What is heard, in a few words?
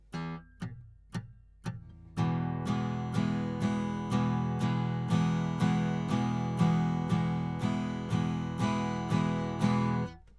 Strum 120bpm